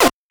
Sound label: synth; osu